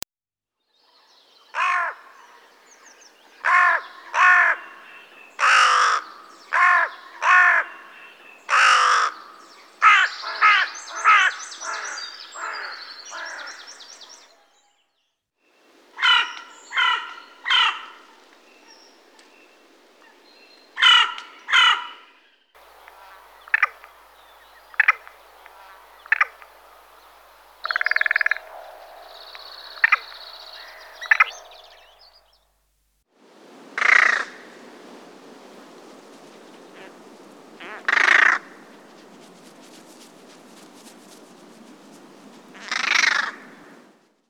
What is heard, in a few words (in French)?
corneille noire, oiseaux